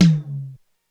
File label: Med Tom, Korg DSS-1 Drums